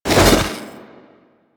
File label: Trooper Melee Whoosh; Warframe; DE; Sentients